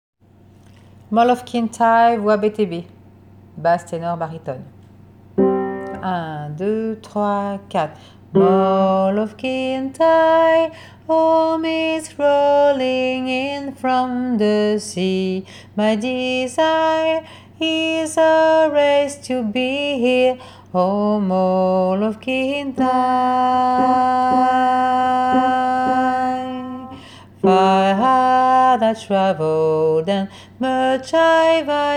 basse tenor barytone; couplet refrain couplet lalala

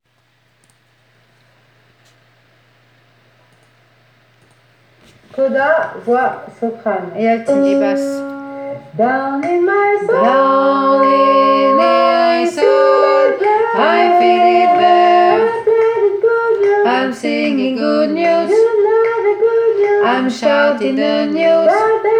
voix basse

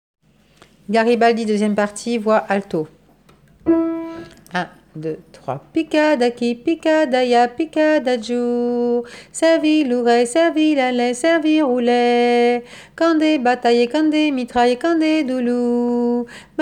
voix alto

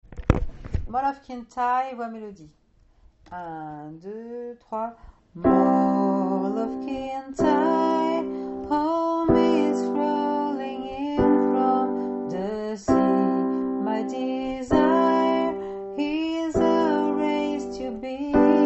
melodie

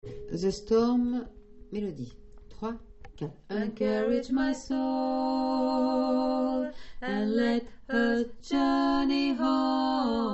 tenor alto

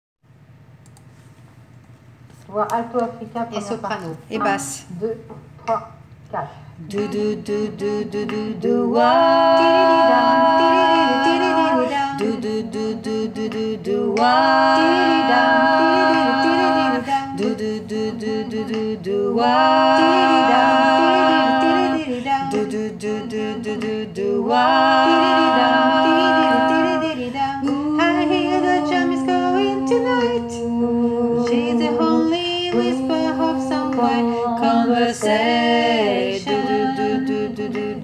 voix basse

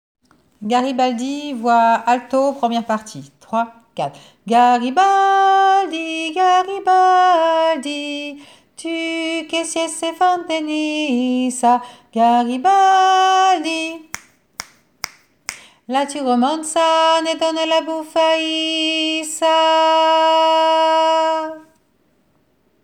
voix alto

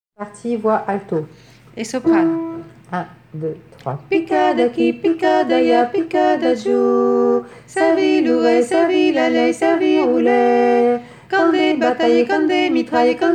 vois sopran; 2e partie juste pour quelques soprane

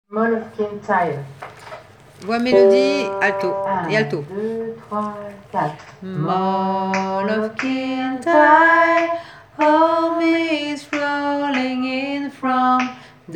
couplet refrain couplet refrain lalala melodie et alto

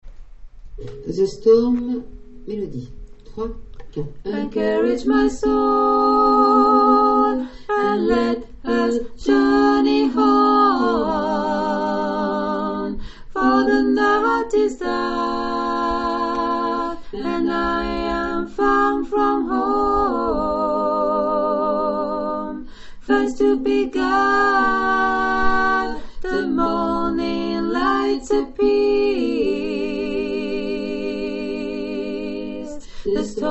3 voix sop

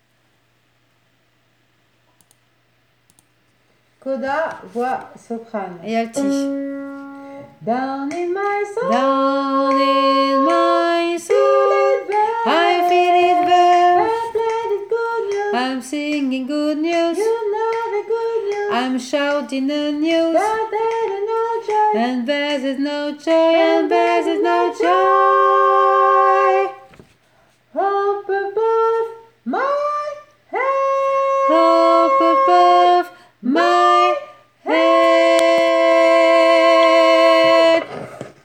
voix alto

